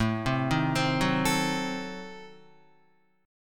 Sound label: A Diminished 7th A down Guitar; Adim7 arpeggiated; JGuitar